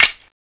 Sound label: clip out; tfa cso; snakegun